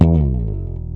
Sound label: WAL BASS